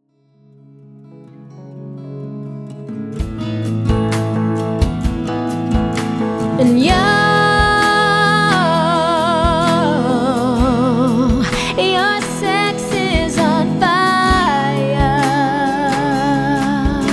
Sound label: acoustic pop